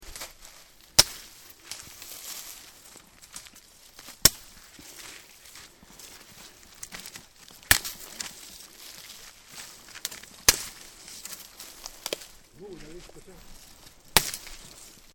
casser le sapin

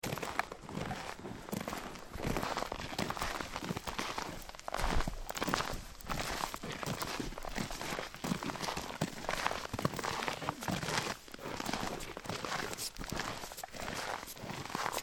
marcher dans le bois

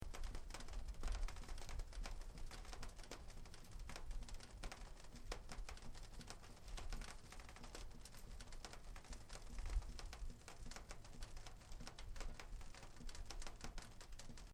feu

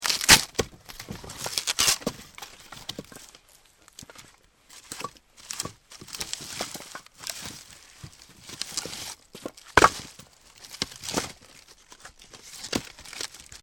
rentrer le bois

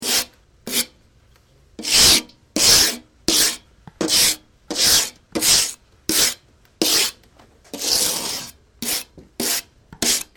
gratter le poele

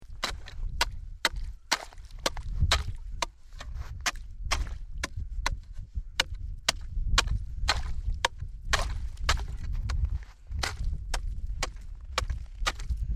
percer la glace

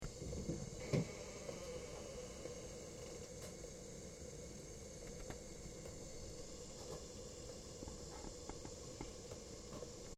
eau qui bouille

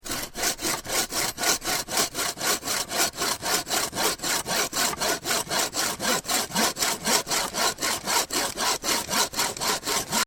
scie

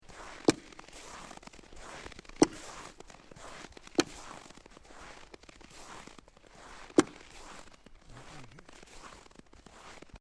marcher sur la neige

